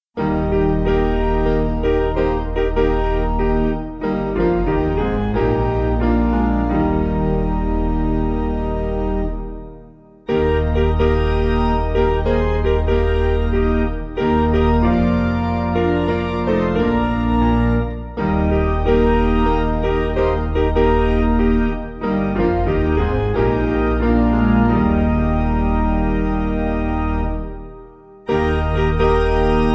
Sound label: O Piano